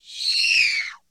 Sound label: Pterodactyl hatchling distance screech